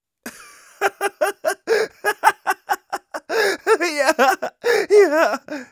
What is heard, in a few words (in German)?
laugh long; Scout